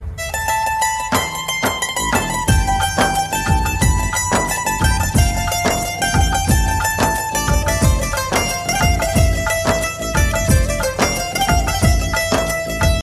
best music ringtone

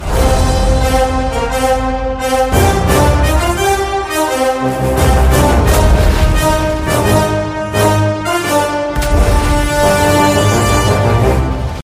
telugu tone

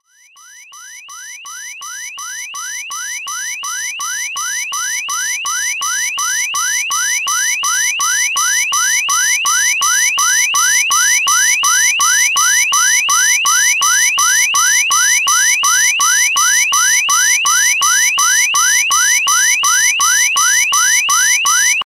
nokia wakeup ringtone

